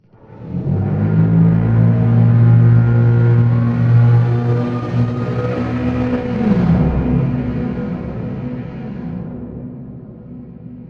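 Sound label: ext cables pulse right; Half Life Alyx; music; vault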